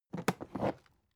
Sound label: alu case small handle